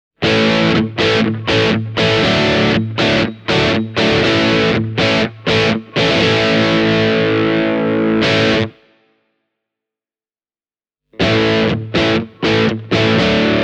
viitasaari om crunch bridge pu 3 way; Viitasaari Guitars OM; Olli Viitasaari; Järvenpäässä

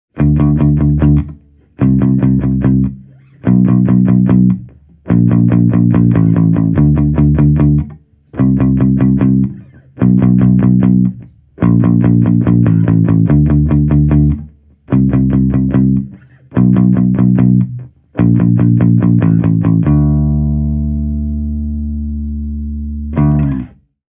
Jazz Bass – plectrum

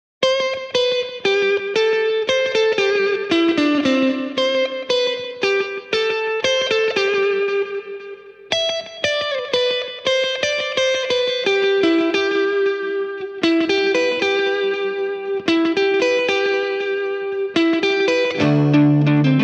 vox ac30s1 demo medley guitars only

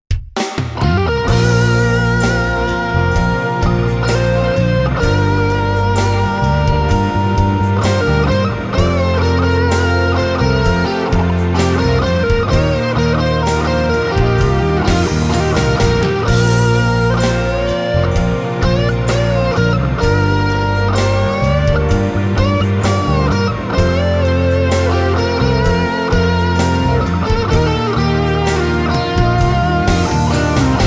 Valeton Dapper Mini, demo track

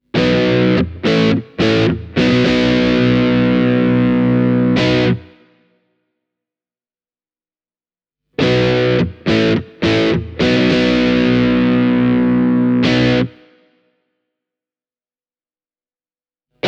dual tone style distorted; rautia guitars

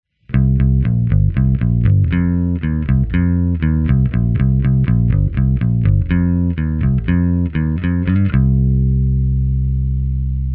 musicmaster plectrum, bass; squier musicmaster bass

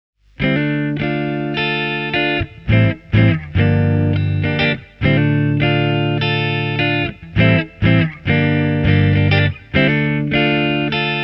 marshall dsl5c hamer classic line

